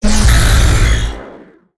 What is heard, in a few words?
chakra cast; weapons; dota; shredder